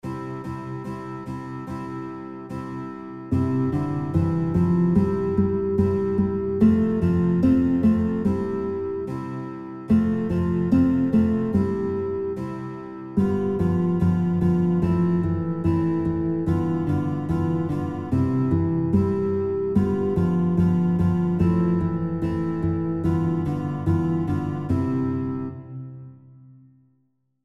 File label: Guitar; Guitar Songbook; Fuchs Du Hast Die Gans Gestohlen; kinderlieder gitarre playalongs